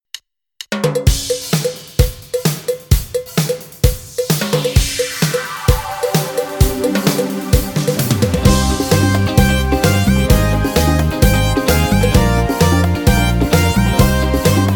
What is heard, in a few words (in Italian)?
samba